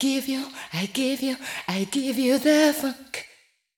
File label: VOICE GRL HOUSE 125 A, House Voice